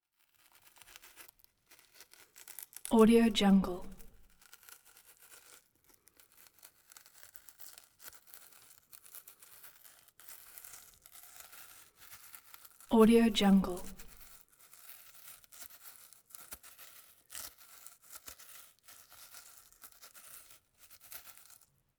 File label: int grinding flesh crunch